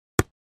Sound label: plastic ball